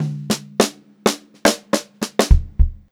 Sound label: FILL, Funk-Groove Drumloops; USB Soundscan vol.38; AKAI; 90 s Sample CDs